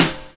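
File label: SNARE TO HH; Univers Sons - Kitch 70s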